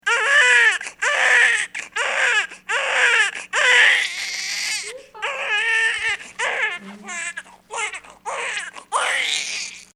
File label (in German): soli; klaus osterwald